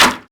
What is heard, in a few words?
wood hit, bullet hit; projectiles; Max Payne